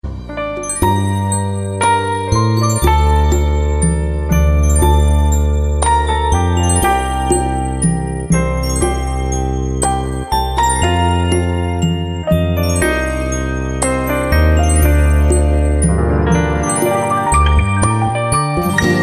Light Ringtone

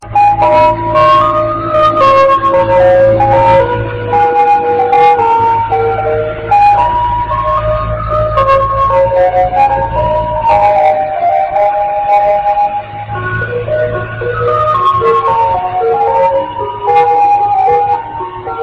Ice cream truck

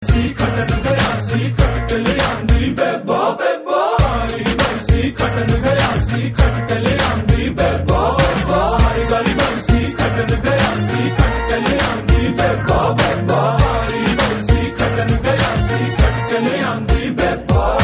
Punjabi

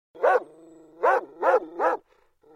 Dog Barking

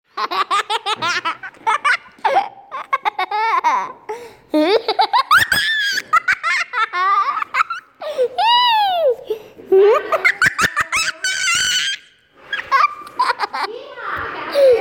Contagious Giggles

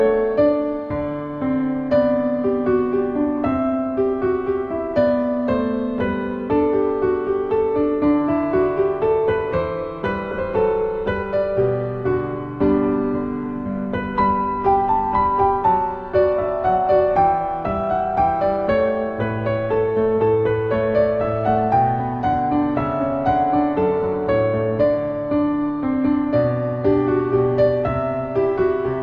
Calming Piano

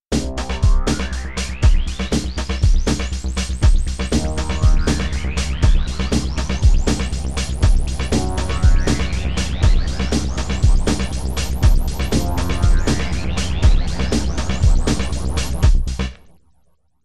dark bass

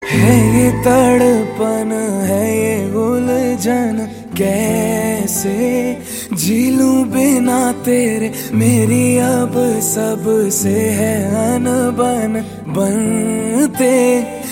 Soft Tune

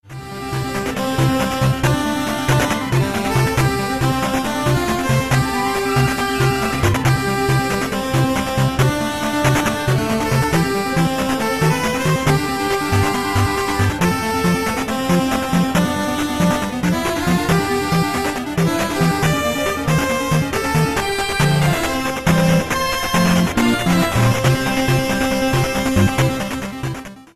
8-bit; Odyssey

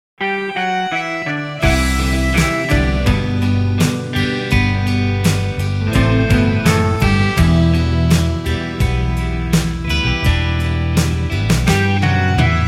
Nice Melody

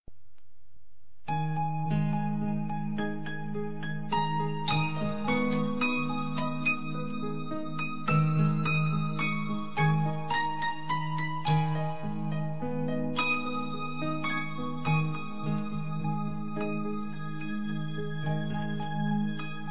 Nice Music

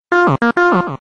Electroduck

